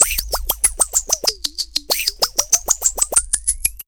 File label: 127 PERC, PERC LOOP, Fresh Disco House; USB Soundscan vol.20 - Fresh Disco House I